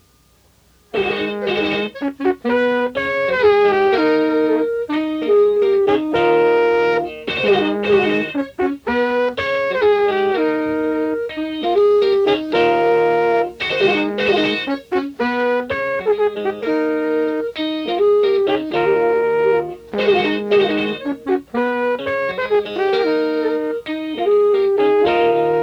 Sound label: little fanfare